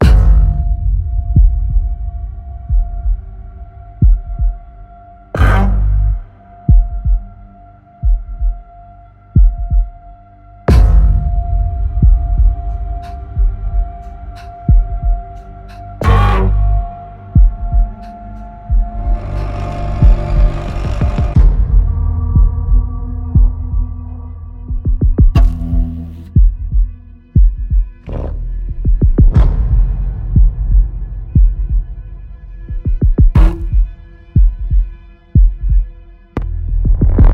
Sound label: Tension